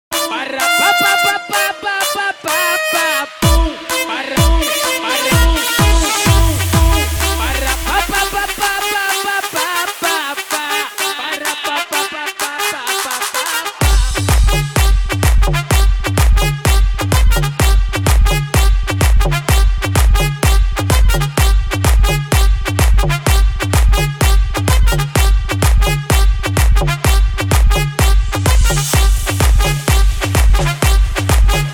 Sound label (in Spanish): trompeta y guracha, latin house